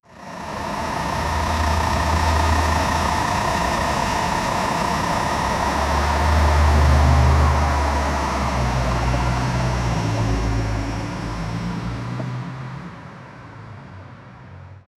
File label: a bus is stopping to